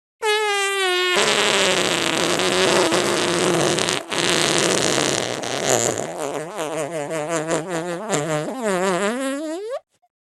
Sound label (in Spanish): sonido gracioso jeje